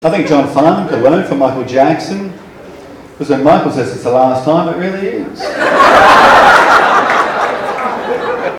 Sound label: Ring Tone